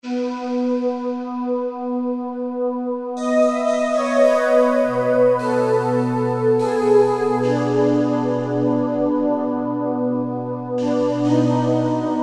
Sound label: Fantasy, Korg T3